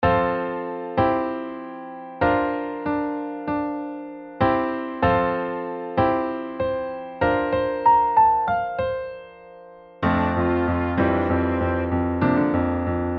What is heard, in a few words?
piano soprano melody